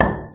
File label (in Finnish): palikka, Clangy Crunchy Foundsound Kit